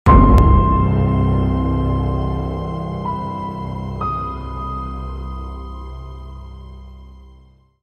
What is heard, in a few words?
Dark cinematic suspense riser horror